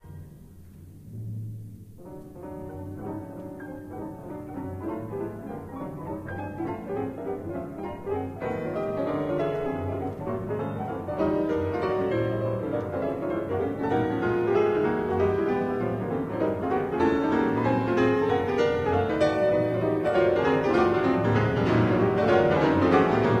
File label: Sonata for two pianos and percussion, Assai lento, Allegro molto